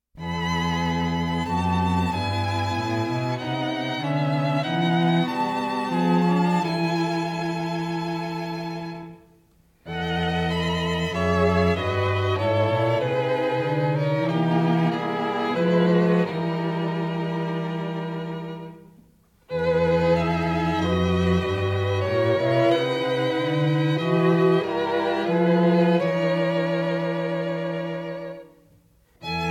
String Quartet; String Quartet No. 3, Mosaic, I.

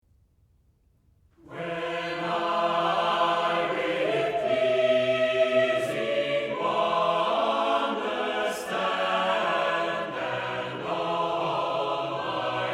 Fuguing Tune; Creation

